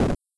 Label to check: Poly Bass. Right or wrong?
Wrong